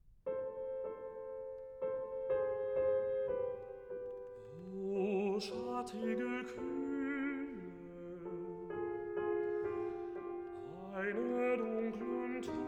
Recitativo

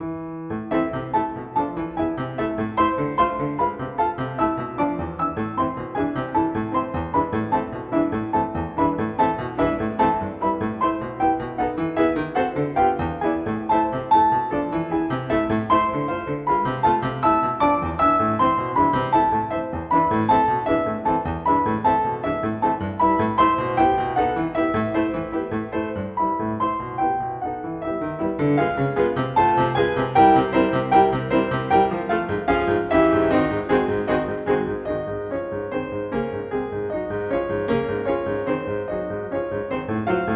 Etude in G-Sharp Minor, Thirds; Op.25 No.6

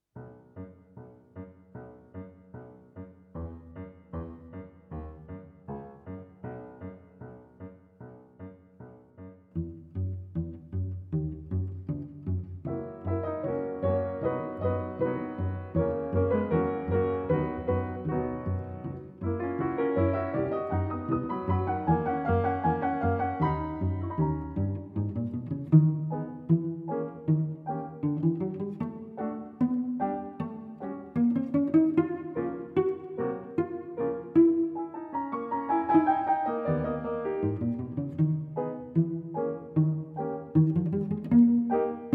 Cello and Piano

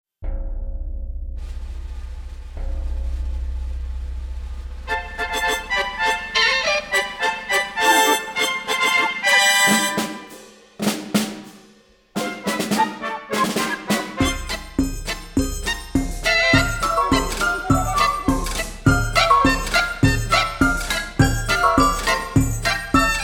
Symphony Orchestra

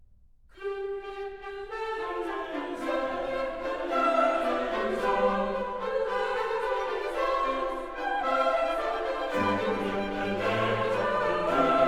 Psalm 112 in F major; ZWV 82, Laudate pueri Domi

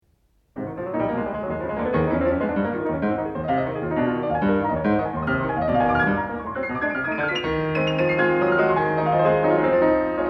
Orchestra, Carnaval Chiarina; Paris Conservatoire Orchestra, Gy rgy Cziffra, Pierre Dervaux; Op. 9

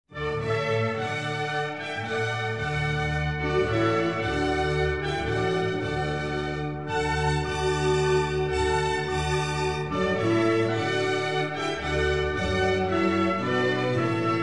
Water Music Suite No. 2 in D Major, Lentement; HWV 349 IV.

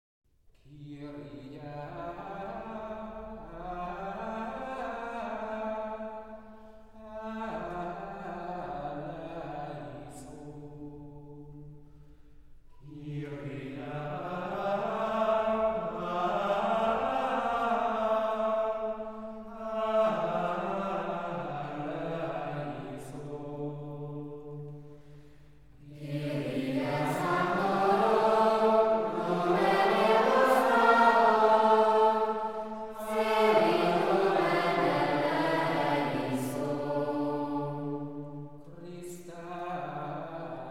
Restful Warm Spring Rain

